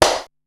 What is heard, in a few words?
Tenny Hit, Korg Wavestation-SR, Drum Kit; Wave 455 - Drum Kit